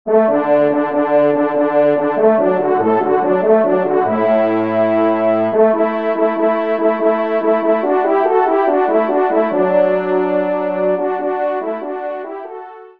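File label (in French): duo, ensemble, trompe cors; Kling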